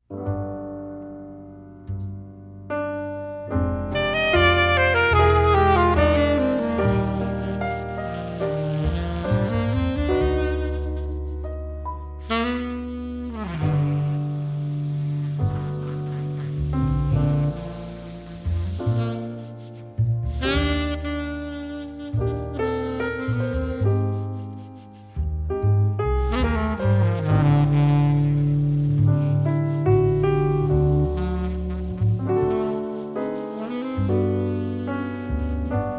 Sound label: saxophone